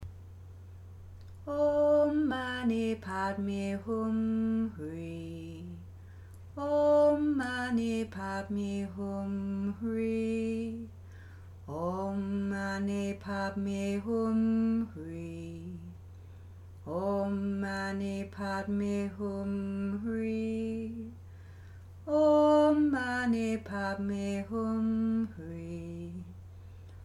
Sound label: Chant Om Mani Padmi Hum Hrih